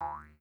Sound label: Game Jump Sound Boing